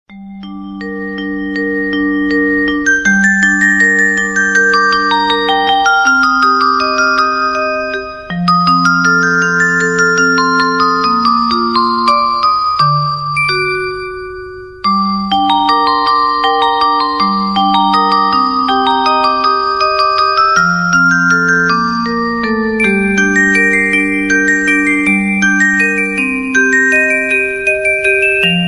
Ringtone for Android Mobile; ringtones

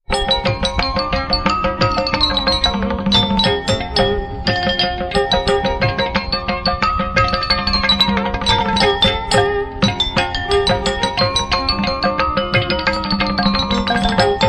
Jalatharangam Music Ringtone

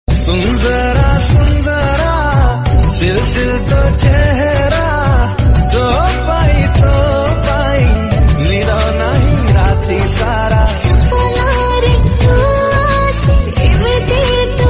Odia Song Ringtone

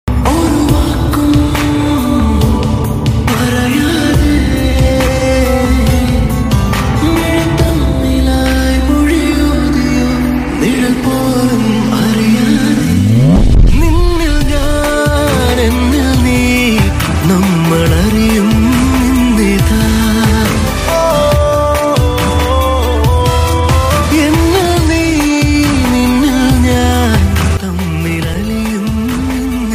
Malayalam Ringtone

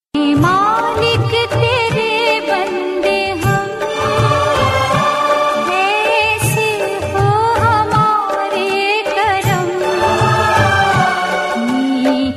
Bhakti Song Ringtone

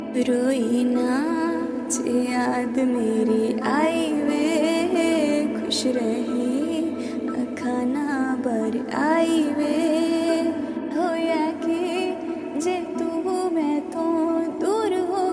Female Version Ringtone

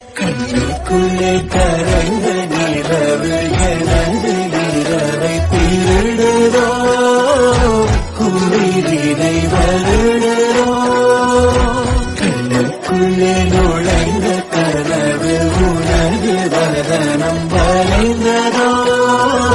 Tamil Movie Song Ringtone

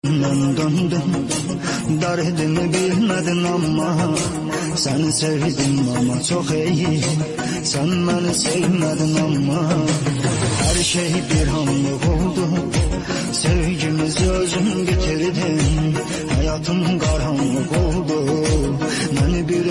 Arabic Ringtone

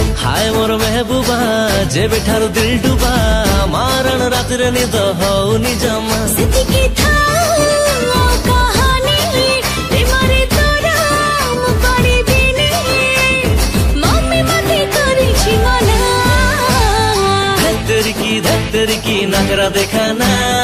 Love Song Odia Ringtone

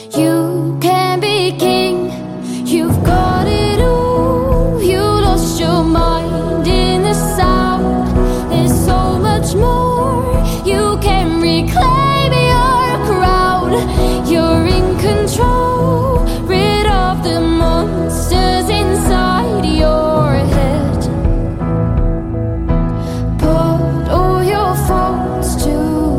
Attitude Ringtone

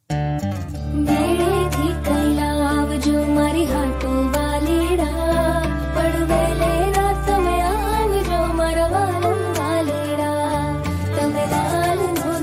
Gujarati Ringtone

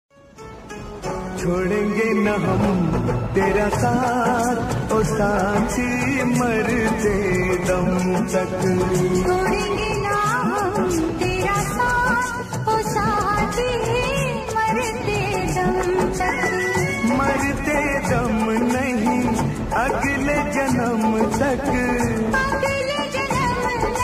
Old Hindi Song Ringtone; ringtones

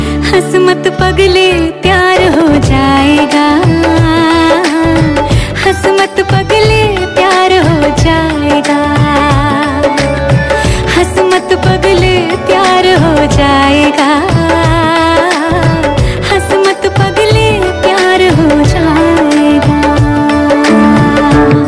Bollywood Female Ringtone

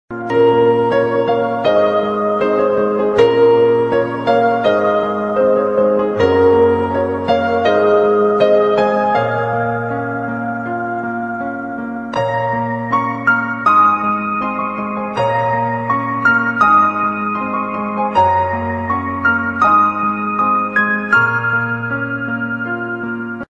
Instrumental Song Ringtone; ringtones

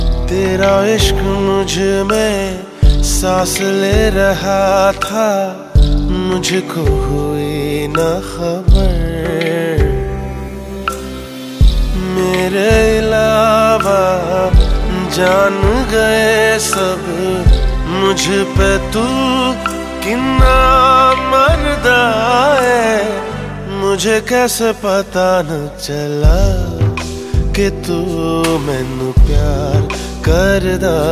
New Love Song Ringtone

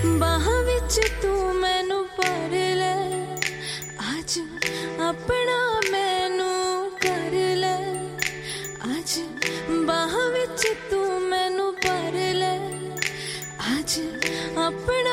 Most Romantic Ringtone

